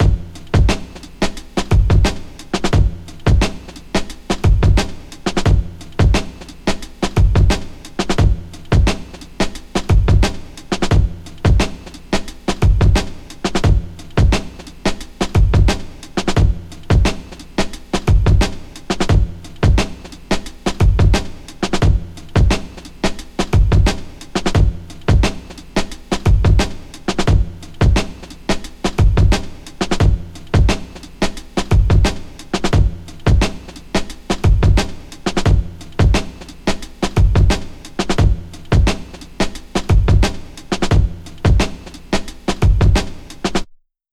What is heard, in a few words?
88 Wooden Dustbin, breaks; Lockdown Breaks